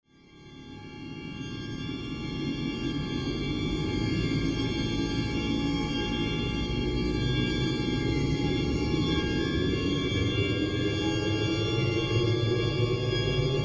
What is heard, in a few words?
Horror Music